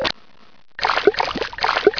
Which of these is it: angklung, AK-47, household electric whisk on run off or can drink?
can drink